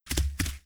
Majestic Lion run

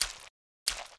Scorpion Walk

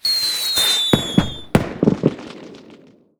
Fireworks; Seer; GamigoNA

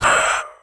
Big Mud Man DMG

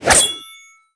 Orc Atk

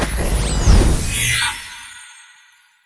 Skill Volley; Seer; GamigoNA

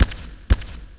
Walk; Marlone Megaton; Gamigo

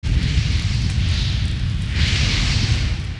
SFX Elec

